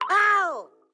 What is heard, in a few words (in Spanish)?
quejido; Bunnicula - The Cursed Diamond